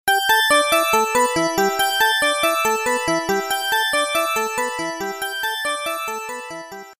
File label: Echo tone